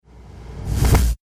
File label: Trailer Hit Magic Double Strike